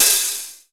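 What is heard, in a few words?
hi-hat, drums; East West; Global Sounds; RY30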